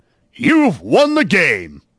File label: Game Win Game; kids-tetris